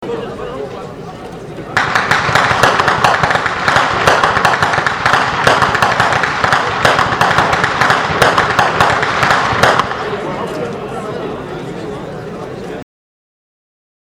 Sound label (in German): schnalzen